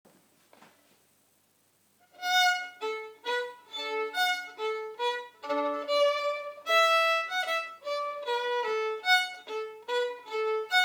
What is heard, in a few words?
Polka; GFW Slow Session; Polkas; Egans